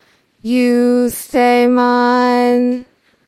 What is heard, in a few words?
singing